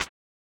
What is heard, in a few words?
Snap Snare; Snares